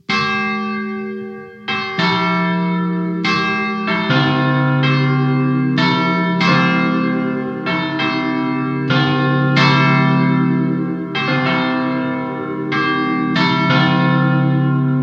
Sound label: 3 Bell Peal; Bellmaster